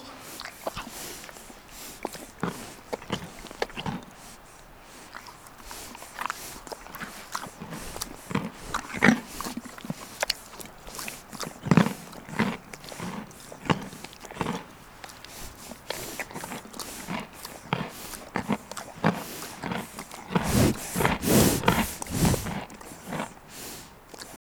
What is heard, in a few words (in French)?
Cheval mange carotte